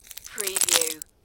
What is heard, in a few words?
Snow and Ice, Bits of ice falling on ice